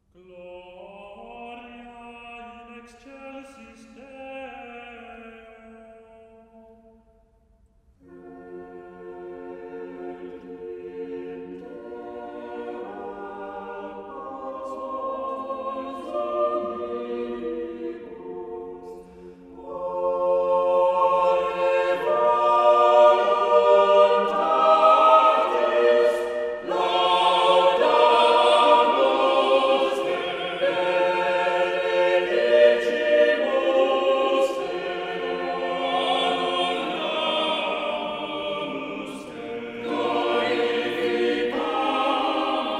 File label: Pope Marcellus Mass Gloria; Palestrina